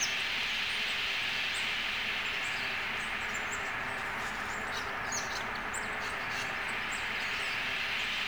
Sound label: Wind; Heroki